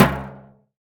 high floor tom one shot c sharp key, tom drum tuned to the C# note